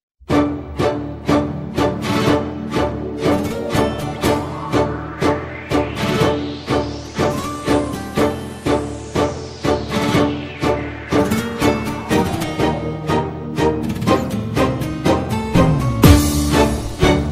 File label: karaoke